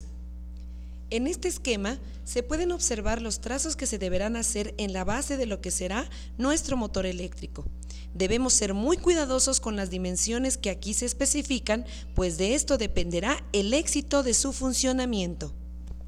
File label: tabla